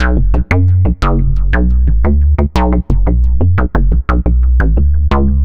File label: Synth Bass